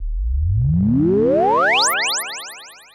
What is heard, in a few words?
Start up Phase